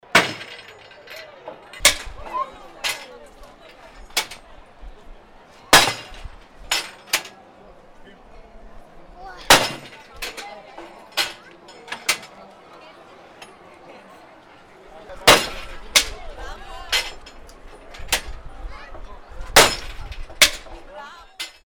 try your strength machine

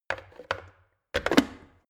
plastic water boiler lid on

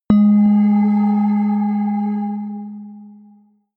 melodic odd ghost bell low

